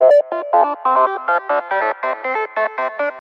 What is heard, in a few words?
Sms Music